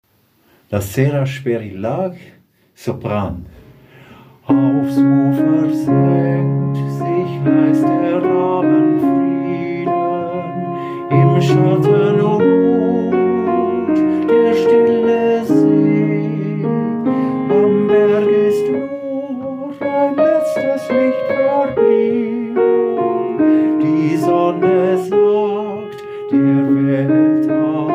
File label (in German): Sopran; La sera sper il lag